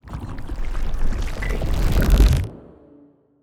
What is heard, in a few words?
relic activation plasmic core; astral planes